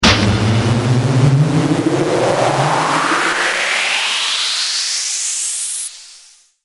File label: Time Travel Alert Tone